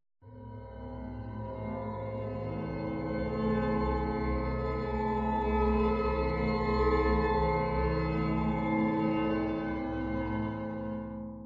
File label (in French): nappe